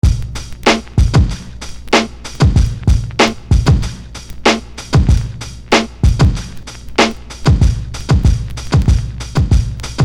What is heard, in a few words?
Beat